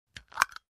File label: Wood lid closing